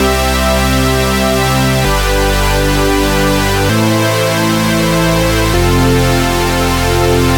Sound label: chord